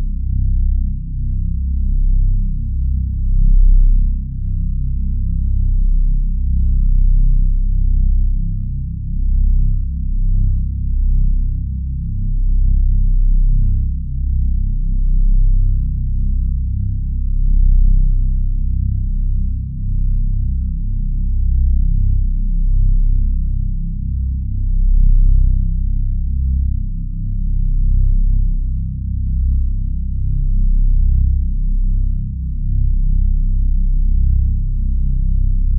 Reese Bass; 808

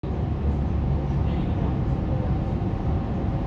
Tram; SFX; IRL Recorded

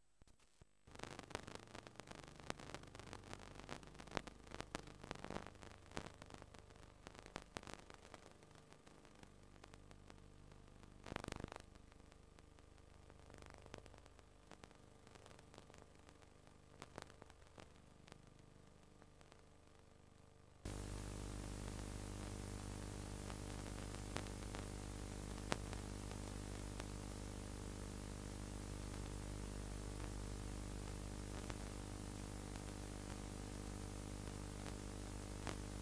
Bad VHS Tape; SFX; IRL Recorded

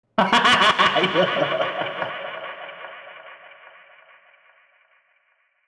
Evil Laugh

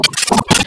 Audio Corruption, Electronic

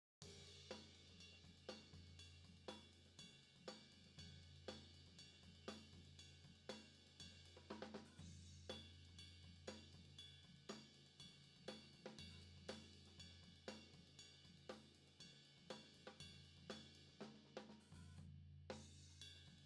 Tom Floor; Reaper project

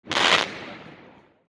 Firework Crackle; SFX; IRL Recorded

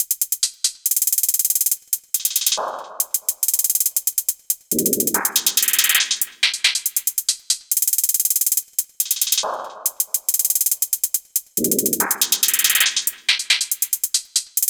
Hi-Hat Loop

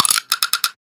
Fill, drums, percussions

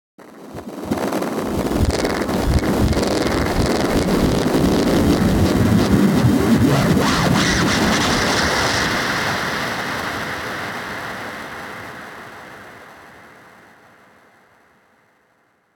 Tape Up, Risers - Uplifters; SFX

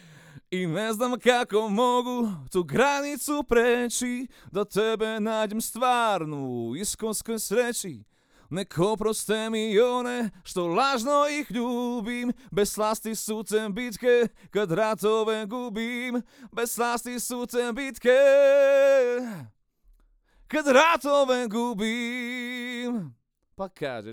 AKG Cardio; Reaper project

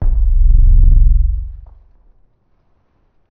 Sub Impact; SFX

